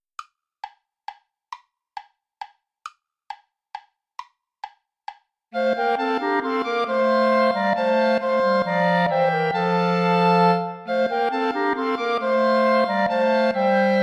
volta play along without bass clarinet; praetorius michael

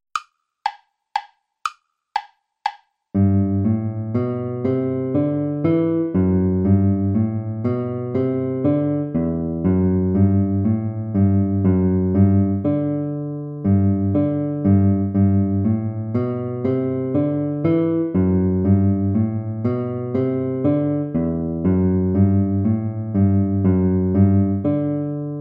baroque minuet major play along

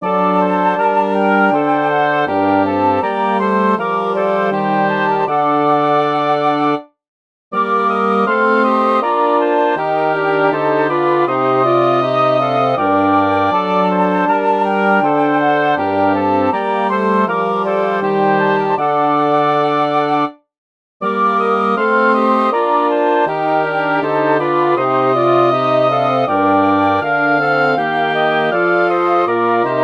for wind quintet; bach johann sebastian; chorale ich ruf zu dir herr jesus christ

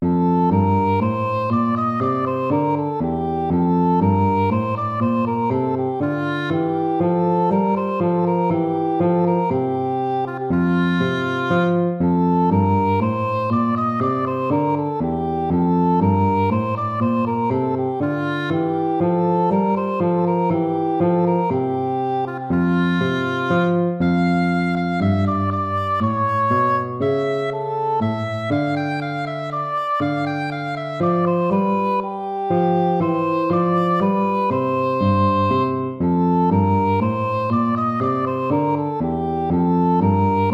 baroque minuet major

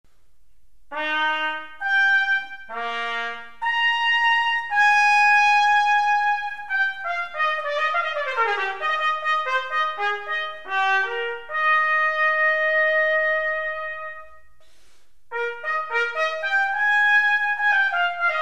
trumpet concerto in eb major; haydn joseph

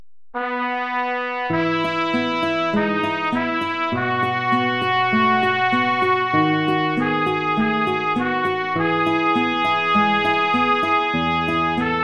etude major; chopin

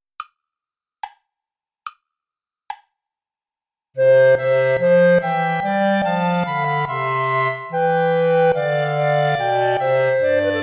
play along without bass clarinet; praetorius michael; nach gra ner farb mein herz verlangt

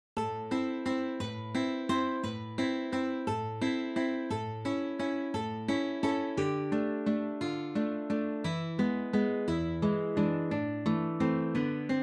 easy pieces for guitar

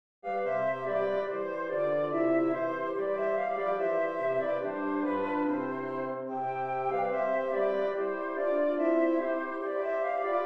deck the hall

